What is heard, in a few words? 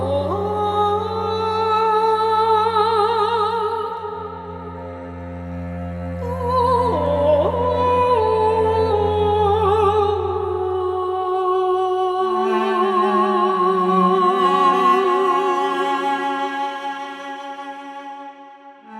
Mandachord Cue; Void Eclipse; Warframe